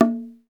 BONGO, Roland; Hybrid Kits; Club-50 - Foundations; Thin

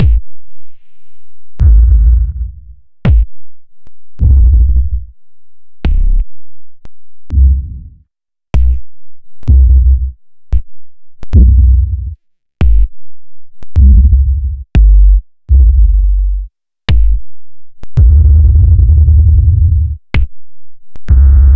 Kicks Postproc; Punchbox

